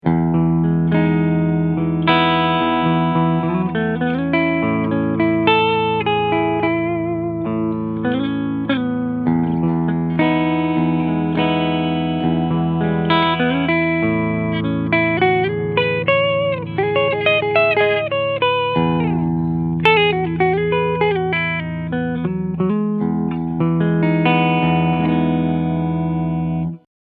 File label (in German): PRS HOLLOWBODY II PIEZO Clean Sound beide PUs